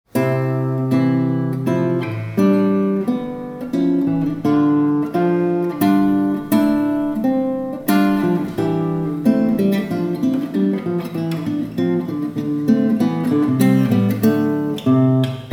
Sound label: study in C moderato; classical